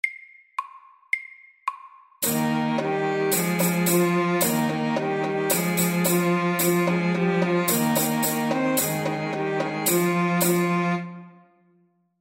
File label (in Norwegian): Folkedans MEDIUM 110